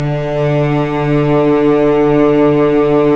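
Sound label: strings, mf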